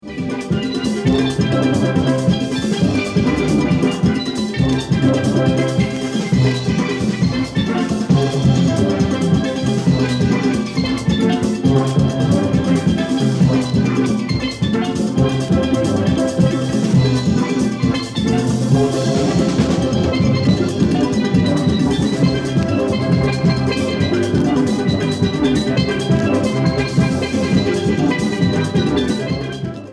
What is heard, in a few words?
Steel Drums; honeymoon